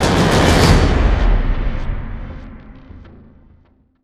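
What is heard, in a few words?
sting, percussion long; transformers war for cybertron gamerip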